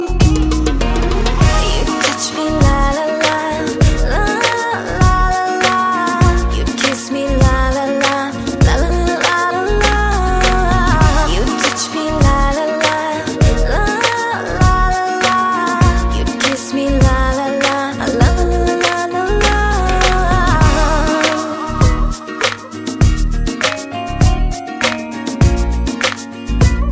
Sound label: Lalalala